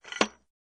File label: Tripod Leg Telescop